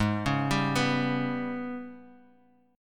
G sharp Minor Major 7th Double Flat 5th, Guitar Half Step Down